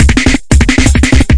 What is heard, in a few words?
jungle, drum loops and breaks; 161 to 180 bpm